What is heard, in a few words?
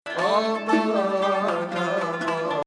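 Hijazkar Nahawand, Arabic music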